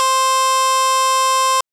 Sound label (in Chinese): 喊叫; 音MAD; 蓝蓝路